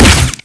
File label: shoot, weapons; thanatos35